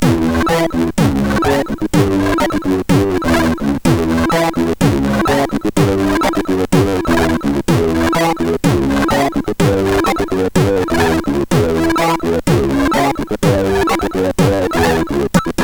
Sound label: Heavy Hit, MOS6581; hvsc